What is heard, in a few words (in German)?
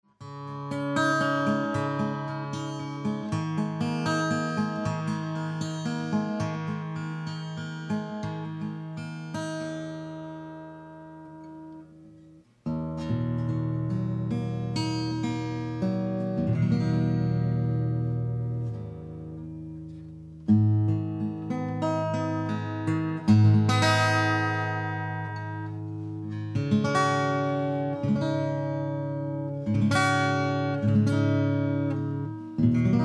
superlux r102 baendchenmikrofon wet